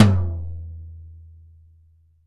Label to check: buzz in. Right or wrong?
Wrong